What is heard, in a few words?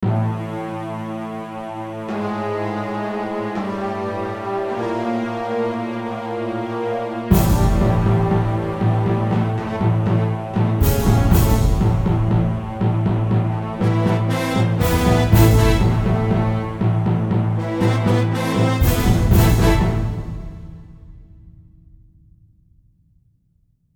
Orchestral Phrase; FXHome